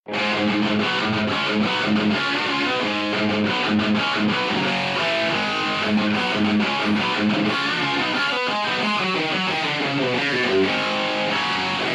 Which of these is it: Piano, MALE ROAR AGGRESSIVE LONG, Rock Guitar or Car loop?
Rock Guitar